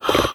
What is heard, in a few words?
breath fast in; reviver